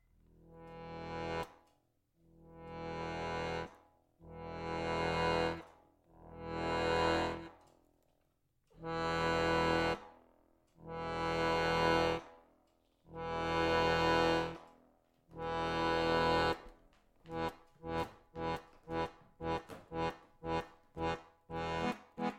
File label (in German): zungengeraeusche m, Bass Hohner Morino VM